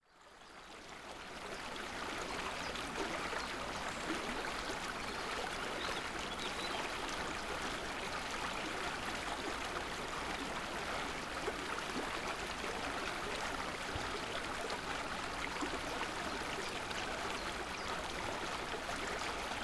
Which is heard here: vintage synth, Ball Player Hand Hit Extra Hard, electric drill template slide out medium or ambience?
ambience